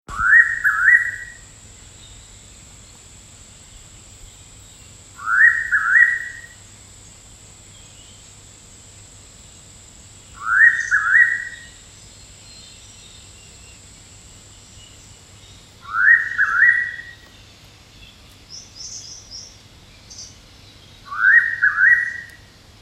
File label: Pitta Versicolor; Noisy Pitta; Family - Pittidae (Pittas); Call